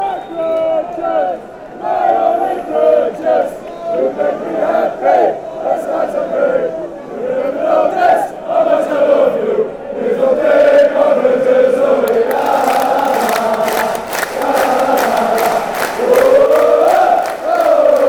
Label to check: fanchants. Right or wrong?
Right